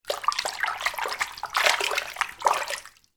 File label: person washing hands in shallow sink water, household